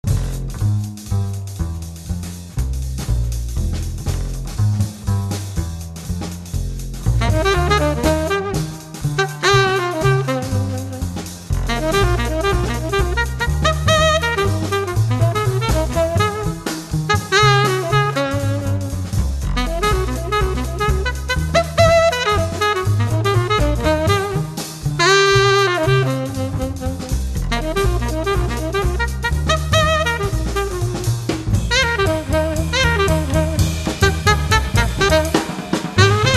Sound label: swinging bird